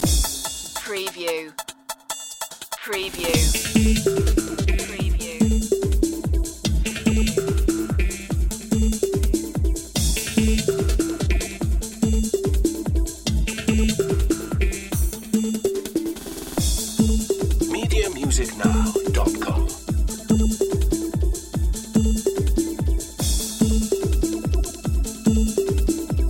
electromanics loop

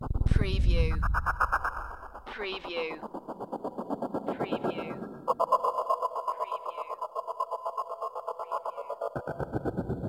Ghostly Robot

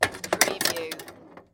Pinball sound, ball released and rattles objects

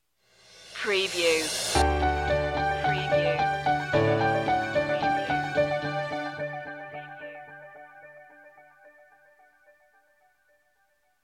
pop celebration stinger

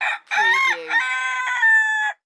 Bird Rooster Cock; sound-effects-bible

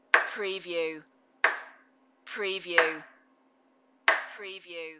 Chef Four Cuts

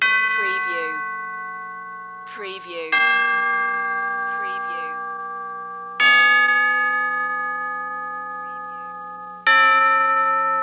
Tubular Bell Ascending

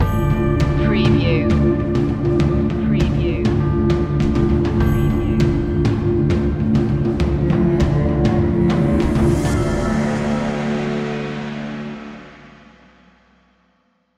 ambient army stinger

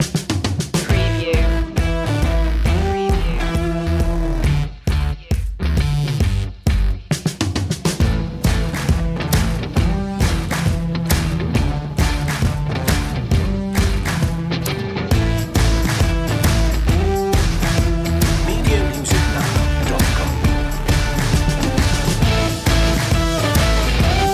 no vocals

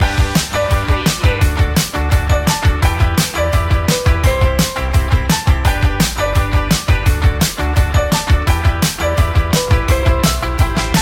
indie people loop

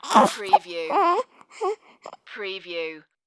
Baby Fussing

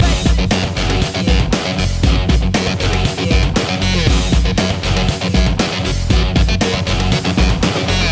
funk loop

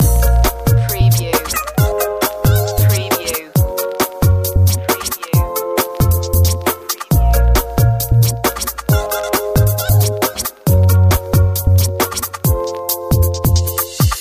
glitch pop loop

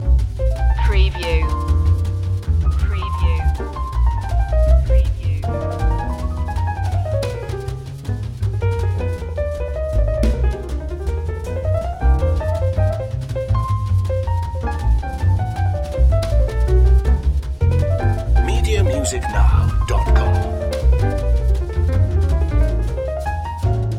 jazz up loop

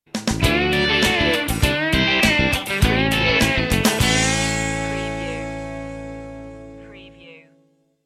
blues rock sting

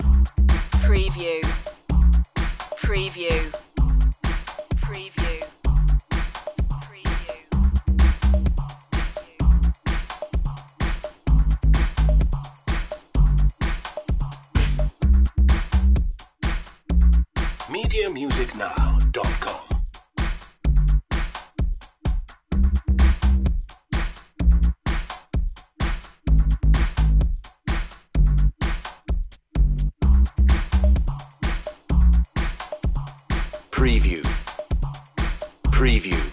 what loop

